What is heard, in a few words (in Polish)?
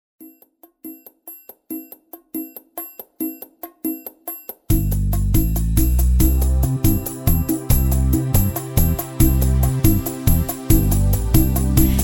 wersja wokalna